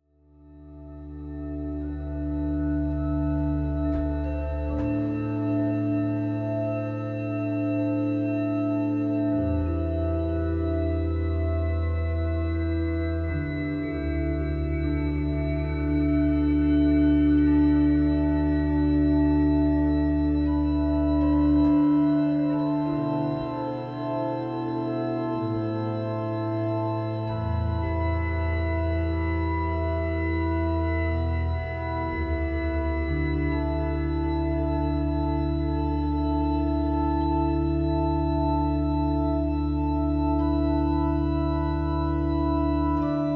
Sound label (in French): Orgue